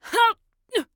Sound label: Grunt Jump; paladins; Princess